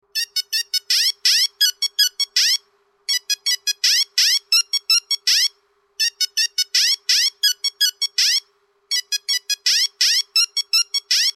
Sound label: nokia mobile ringtone 1100